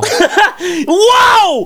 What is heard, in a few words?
hahaha woah; ph enhanced; taunts; garrysmod